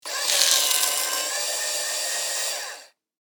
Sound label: Casino slot machine ticket eject